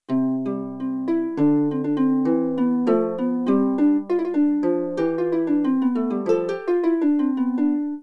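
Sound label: harp melody; dreamcast; Dragon Riders; Chronicles of Pern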